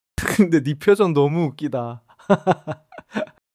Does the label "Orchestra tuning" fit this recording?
No